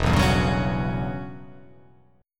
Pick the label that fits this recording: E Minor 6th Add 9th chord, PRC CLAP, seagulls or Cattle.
E Minor 6th Add 9th chord